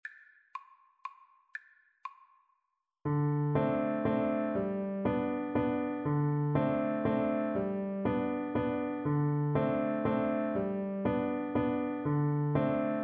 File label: 2 waltzes viola